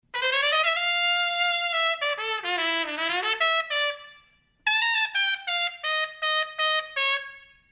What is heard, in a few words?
cherry straight, mutes